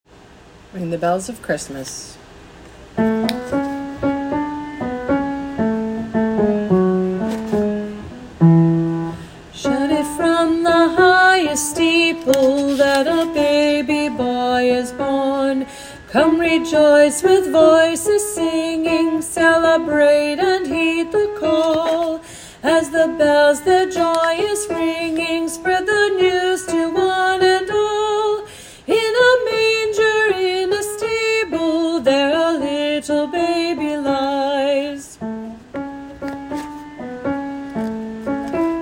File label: Alto; 2022 Winter Music